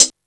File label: H-HC M1, DRUMS; East West; Global Sounds